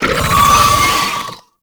Summon Vocal; Warframe; Uriel; Gulphagor

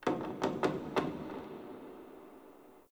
Wooden Creak Small

